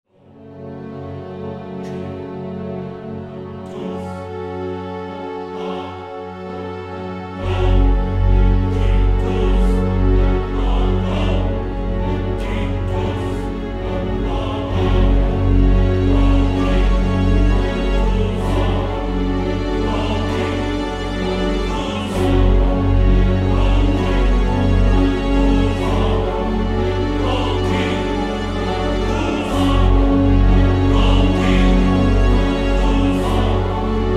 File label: Epic Battle Trailer; postal 3 re-engineered soundtrack 2011; Dreamsfall